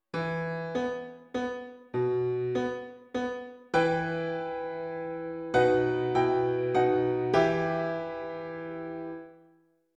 Piano

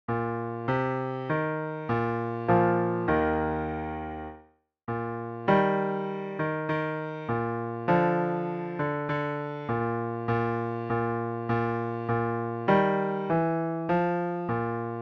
duet

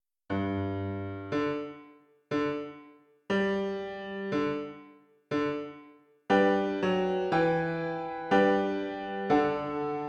Piano

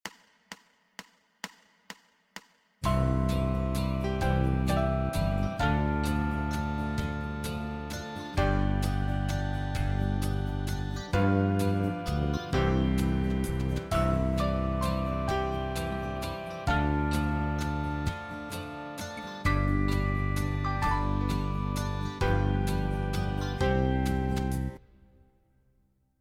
Monkey Mirror BIB

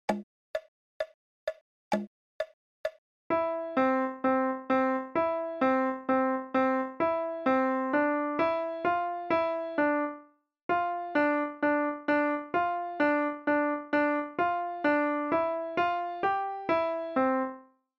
Bullock Cart

